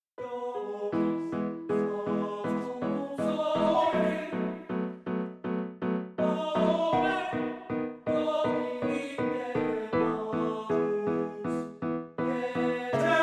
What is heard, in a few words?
Lied per Canto e Pianoforte Maestoso; Rosetti; Lob des Hoechsten